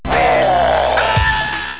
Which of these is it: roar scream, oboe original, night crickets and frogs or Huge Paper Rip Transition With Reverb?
roar scream